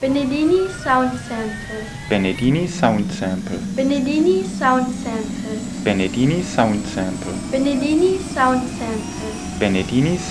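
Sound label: Bell UH1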